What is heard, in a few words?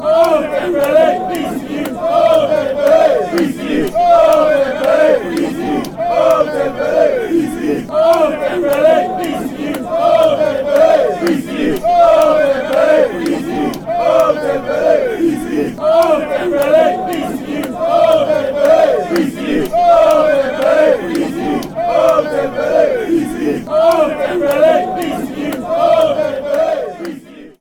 song, chants; dembele